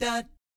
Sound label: DAT A#3, Vocal Jazz FX; Spectrasonics; Vocal Planet; JAZZ COMBO